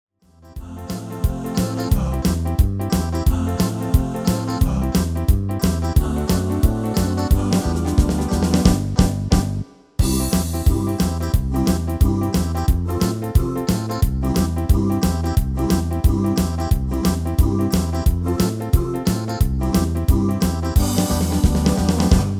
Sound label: MIDI